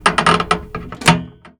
tank artillery mechanical parts handling creaking interior perspective; Bluezone Corporation; Steampunk